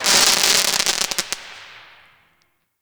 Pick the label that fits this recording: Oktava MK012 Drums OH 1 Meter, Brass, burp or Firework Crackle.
Firework Crackle